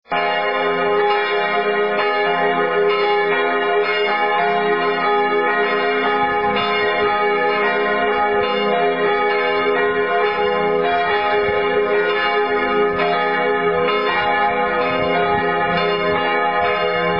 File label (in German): Glocken